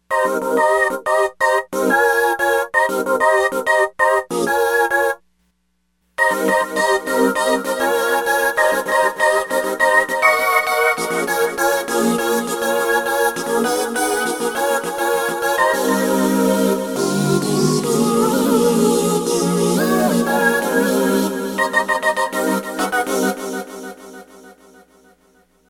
ECHO 2 the LEFT

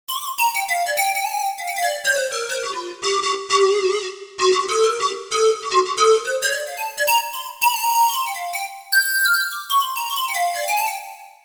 Air Lead, Roland JV-880; Factory Patches

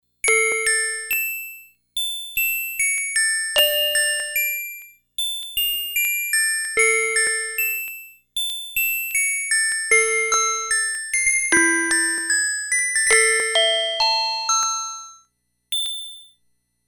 Bells

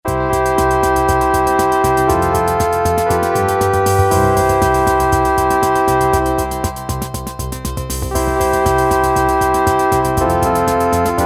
piano + Quadraverb2, Casio MT-68

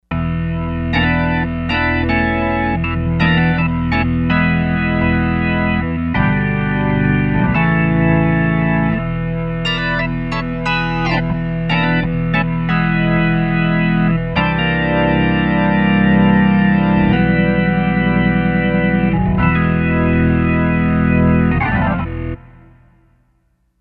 Valves, Kawai K5000S factory presets

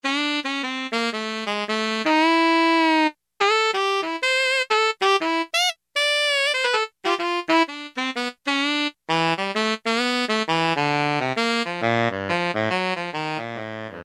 Sax, Roland U-110; Factory Patch

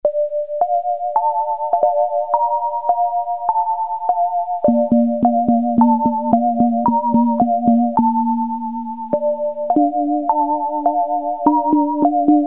FAIRY TALE, Casio CZ-1000 Preset

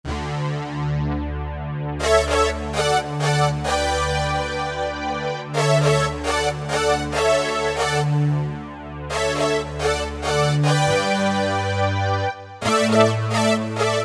Power Pop; Generalmusic Equinox

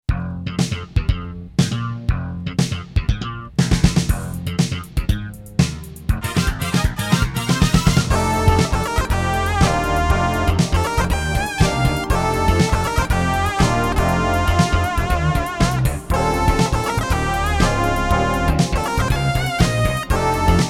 Sequence, E-mu Proteus FX; Factory Demo Songs

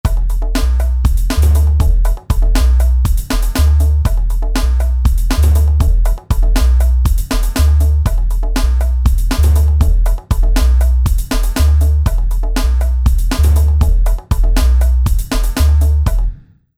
Drum Bass

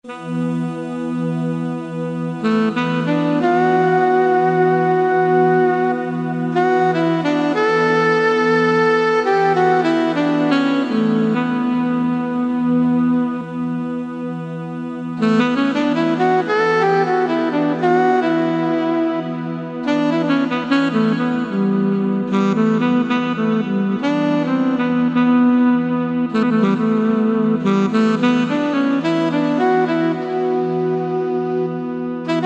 Sax Heaven

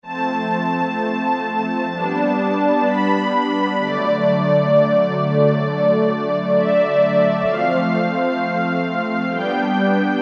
Saw Strings; Korg MSC-05S Piano

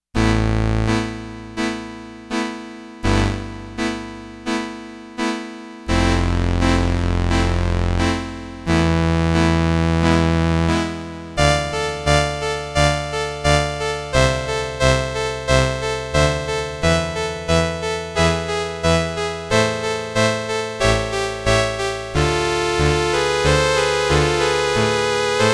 STRINGS I with vibrato & sustain, Roland RS-202

